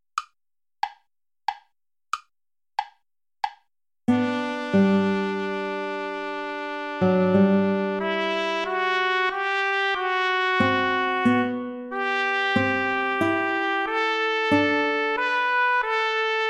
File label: sarabande play along without guitar